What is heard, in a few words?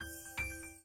sparkling bubbles unlock; Samsung; Galaxy Note 5; ui